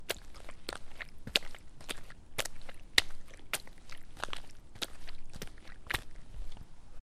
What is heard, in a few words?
Puddle walk